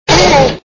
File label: attack